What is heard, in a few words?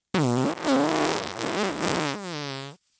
fart; vegan